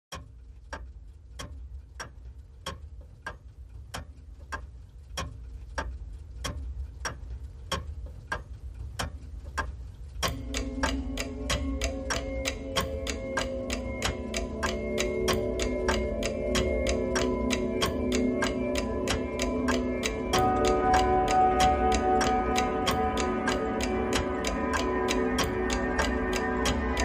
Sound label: Tick Tock Tension